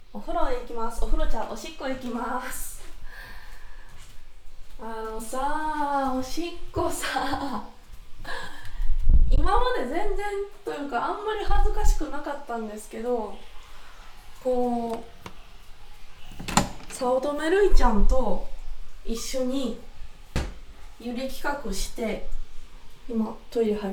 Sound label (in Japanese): おしっこのみ トイレ ステレオ